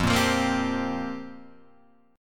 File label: F Suspended 2nd Suspended 4th F; Fsus2sus4 Chord {1 1 3 3 1 3}; strummed